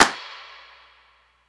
snare trail; Drums; One Shots; Slate; AURORA Sample Pack; Snares

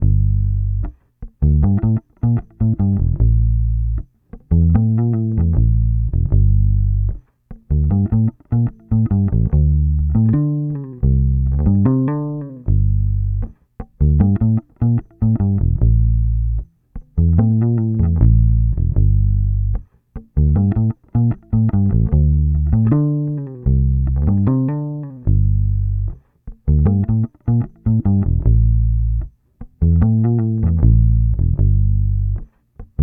Bass loop 76 Gm, Electric Bass Loops Direct; Slate Digital BASSHOLE Sample Pack

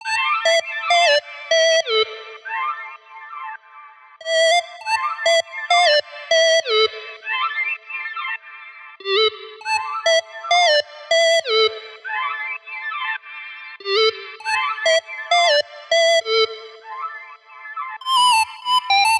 songstarter 100 Am, Pop; Slate; PASADENA Pop Sample Pack; Songstarters

